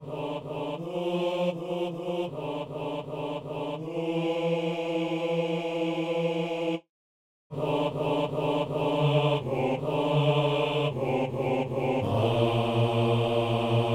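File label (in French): messe simple anamnèse basse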